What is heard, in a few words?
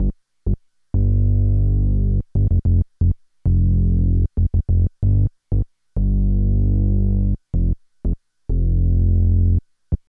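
bass, 95, Hip Hop and R&B; Best Service ProSamples vol.02; AKAI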